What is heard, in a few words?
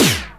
CYM CYM FX, Analog Drums; Roland L-CDX-01